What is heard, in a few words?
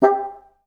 BNS2STAC G3, Advanced Orchestra; Best Service; Peter Siedlaczek's Advanced Orchestra